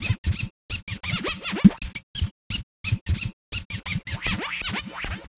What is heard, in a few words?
DJ Work; Sonic Foundry (Sony Creative Software); Stylus Pressure Urban Grooves on Digital Wax; Wickey Wah